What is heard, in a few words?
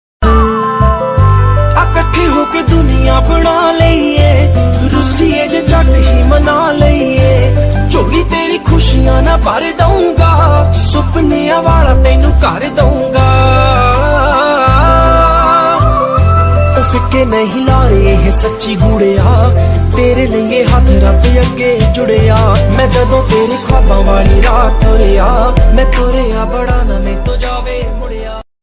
Romantic Music Tone, Hindi, Only Music Tone